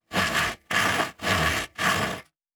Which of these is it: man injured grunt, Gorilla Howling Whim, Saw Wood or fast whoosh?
Saw Wood